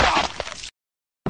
grunt got hit

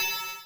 blip 8bit

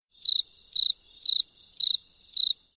crickets

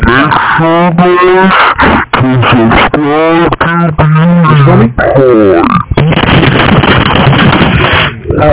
super laggy rawr

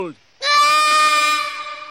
screaming

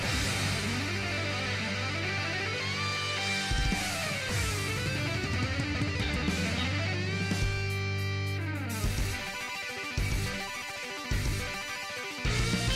guitar music

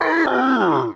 er urgh thwomp

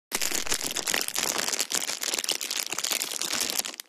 plastic asmr

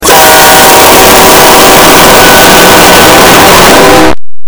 horn loud

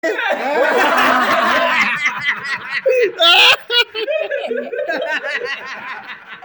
bahahaha

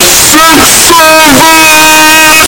boost

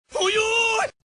uyuiiiiiiii

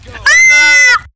scream

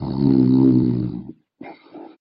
snore; talking ben

